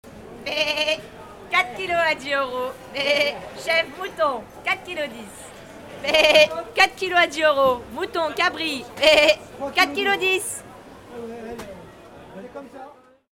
cris bouchère

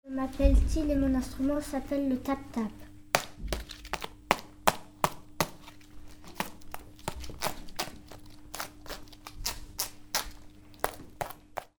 le tap tap

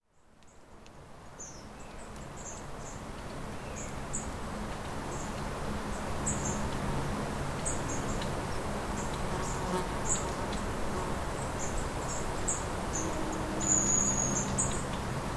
Mesanges a longue queue; MZ-N710; la Borie

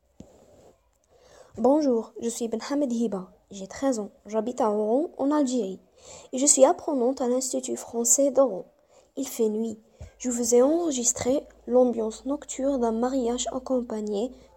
ambiance mariage, Oran

